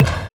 Percussions; Vengeance - Essential Clubsounds Vol.4

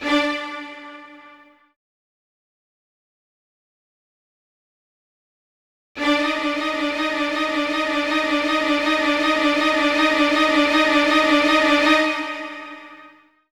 Q String; Zero-G Smoke

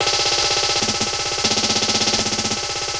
Mechanical Fill, Drums & Percussion; Percussion Fills; FX; Sonic Foundry (Sony Creative Software); Futurist Drum & Bass

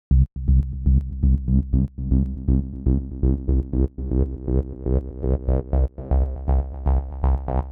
Gated sweep (120BPM); musicradar

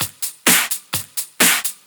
Straight Loop 128 BPM, House

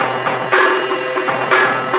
Processed Beat, Drums & Percussion

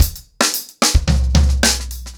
110BPM, ACOUSTIC DRUMS

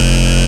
Dance Mega Synth; Best Service; 90 s Sample CDs

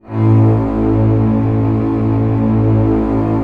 ORC A0 PP, Orchestra; Sound On Sound - Peter Siedleczek's Orchestra

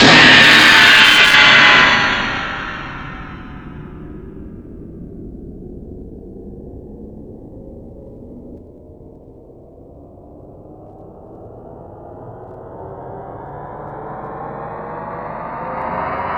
More Cymbals, E-MU Pro-Cussion

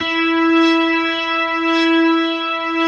E4, B3

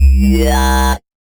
Basses Root C; Synth Shots and Basses; Vengeance - Essential Clubsounds Vol.4; Note C

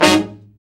BRA SECT; BRA SECTIONS

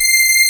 BIG SAW C6, Analog, Synth; E-MU Formula 4000 Series Vol. 3; Analog Odyssey; Synth Builder

